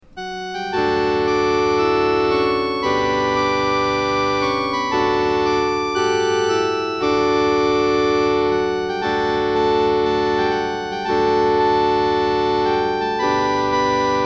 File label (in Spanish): himno instrumental